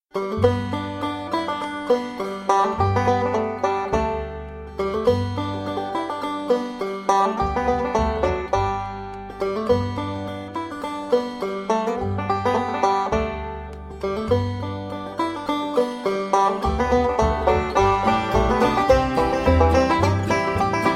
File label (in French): banjo, cordes pincées; le banjo